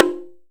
SHIME, JPN DRUM

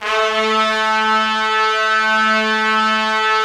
Trumpets; Roland - LA Composer vol. 4

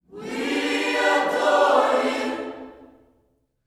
WE ADORE U, Vocal Planet Gospel; Spectrasonics; GOSP PHR

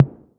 808 SOFT; Wizoo - Platinum 24 Electronic

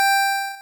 Beep; Bitkits; Blip & Blop

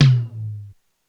Lo Tom, Korg DSS-1 Drums

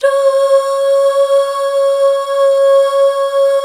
Duh Fem; BACK. DUUHH; HAL

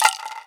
RATTLE, INDIAN PERC; Didgeridoo and Other Primitive Instruments

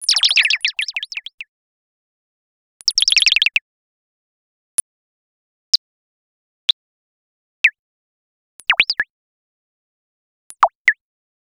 FX Cat&Birds; X-Static Goldmine 5

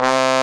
BRASS C2, pop brass; AKAI S6000 CD-ROM - Volume 1; BrassWind

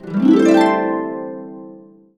C, Harps; USB Soundscan vol.62; AKAI

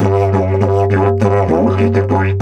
G 100, DIDGE 100BPM, Didgeridoo

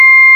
PURE WAVE

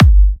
G, kicks; markus hakala kicks

